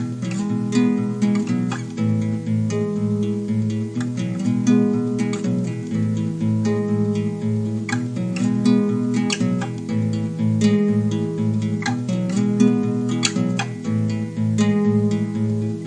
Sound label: 120 sweet climb guitar, Folksy Guitar; REMIXABLE COLLECTIONS; Folksy Guitar and Voice Stems